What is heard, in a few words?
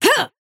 effort melee small